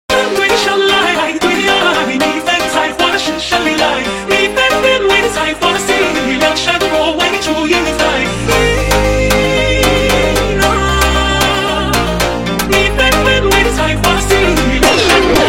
Echoing Jumpstyle slowed

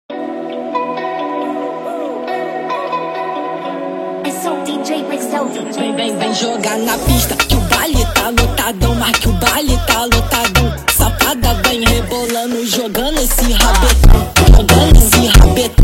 FUNK Sped Up

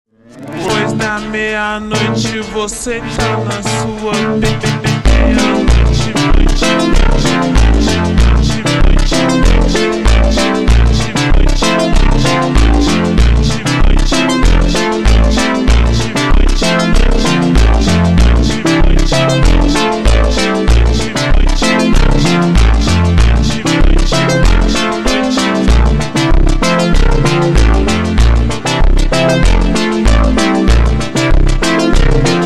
Montagem ultra Slowed

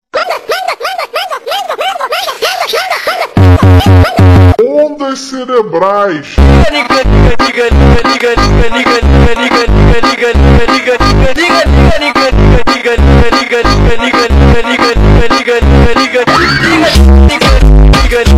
MONTAGEM FUNK (Slowed + Reverb) nightcore

